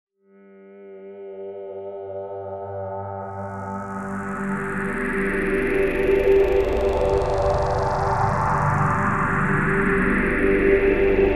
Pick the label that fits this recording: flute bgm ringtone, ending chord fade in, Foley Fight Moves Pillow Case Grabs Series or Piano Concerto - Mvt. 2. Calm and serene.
ending chord fade in